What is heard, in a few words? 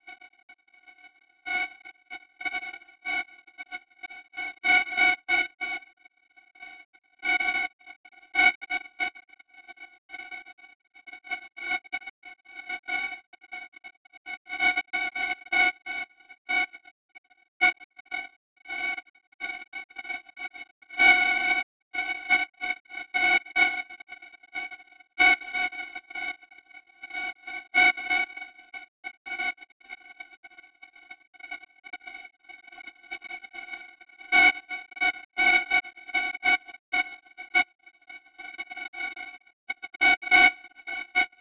Jazz